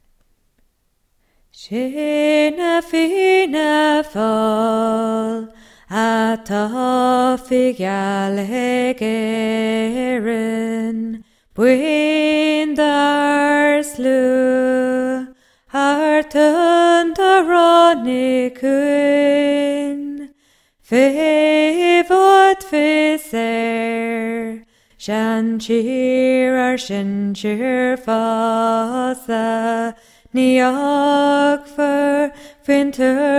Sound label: National Anthem